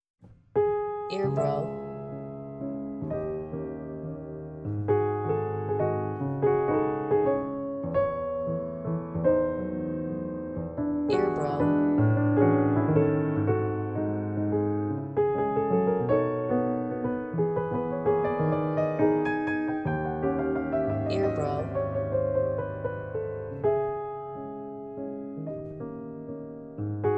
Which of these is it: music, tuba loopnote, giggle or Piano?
Piano